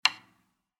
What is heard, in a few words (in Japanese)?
かっ 生音